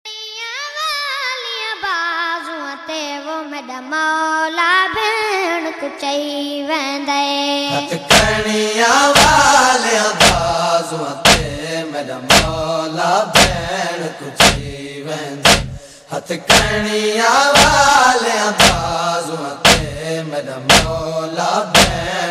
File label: noha ringtone